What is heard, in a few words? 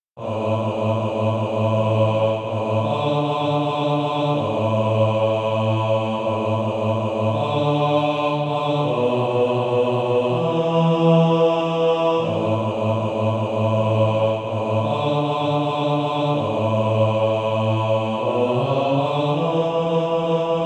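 bass; SATB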